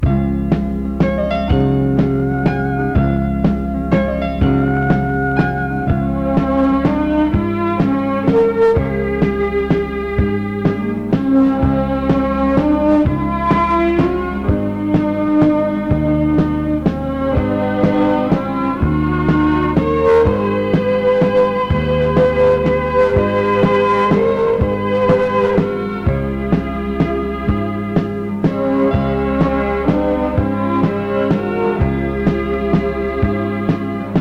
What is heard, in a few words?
southwestern waltz